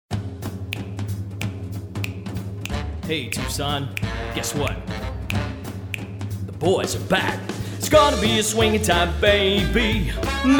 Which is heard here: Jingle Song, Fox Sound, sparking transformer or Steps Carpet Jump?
Jingle Song